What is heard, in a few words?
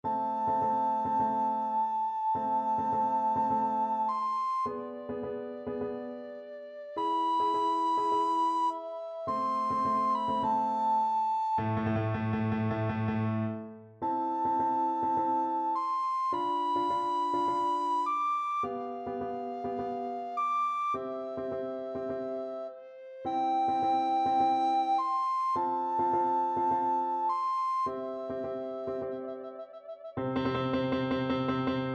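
summer 2nd movement four seasons recorder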